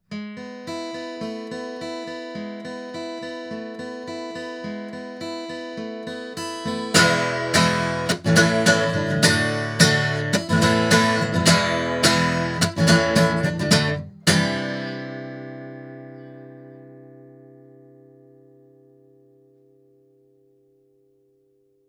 guitar; BLUE The Ball; microphones

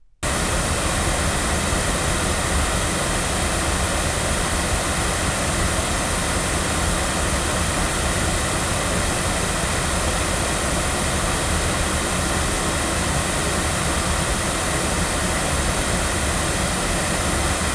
loudspeakers; Lauten Clarion; cardioid; microphones

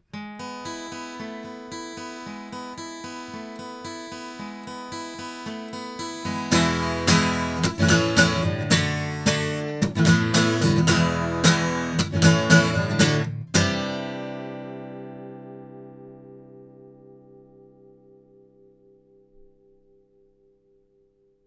guitar, microphones recordings

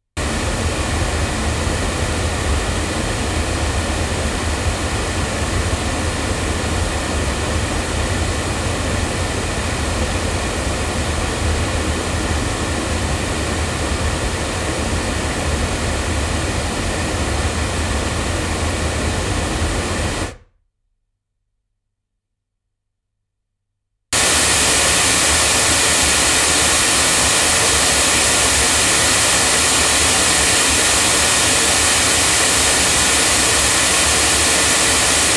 Oktava MKL-2500 loudspeakers

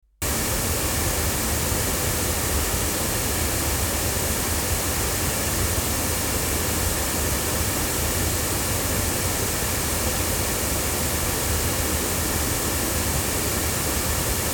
sE 2A hypercardioid loudspeakers, microphones; se electronics

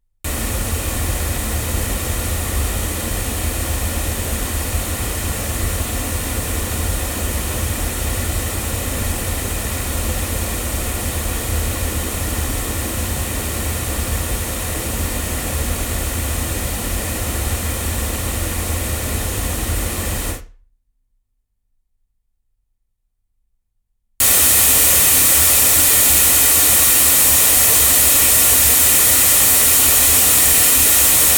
MT97 omni loudspeakers; TSM

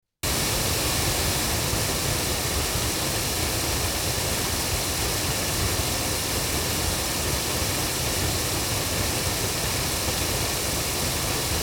Generis GT2 Mk2 8 loudspeakers

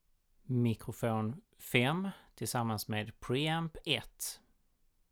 mic pre

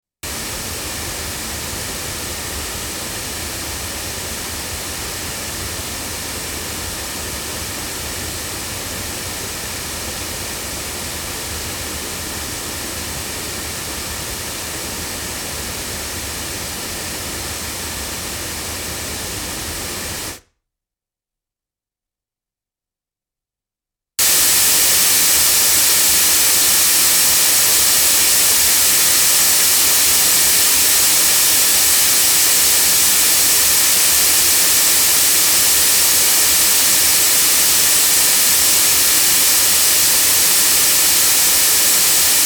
Rode NT3 loudspeakers

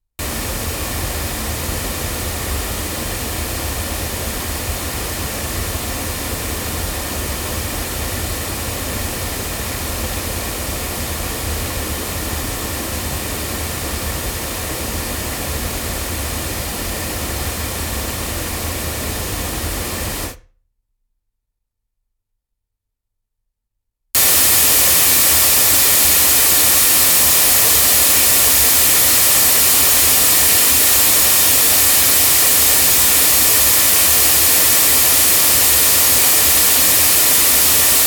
Rode NT2 cardioid loudspeakers

